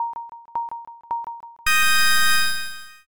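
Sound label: countdown new year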